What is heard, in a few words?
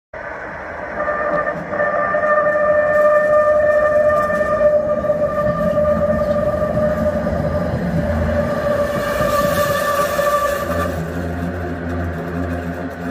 tram crossing